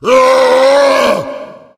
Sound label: tank yell, voice yell; Left 4 Dead; Source Engine